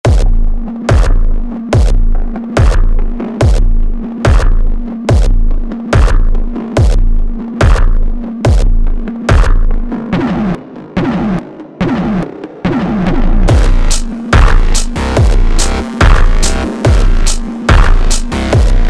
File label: ultra Slowed, funk